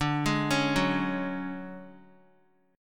D Major 7th Flat 5th D down Guitar 4F 4A 5C 5G 5C 6E; arpeggiated; JGuitar